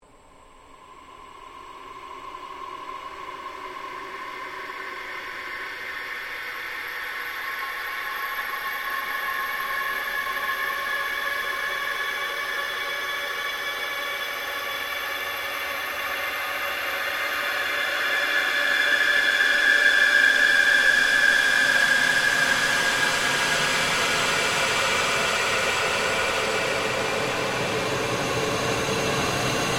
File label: Ambient Sweep Very Slow, Drone; Sound effects created by manipulating other sounds; Drones (Sound Effects:Sound Design)